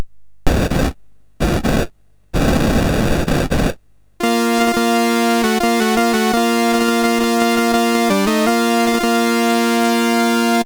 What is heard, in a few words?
Fanfare, CSG8580; CSG8580R5; Nosfera II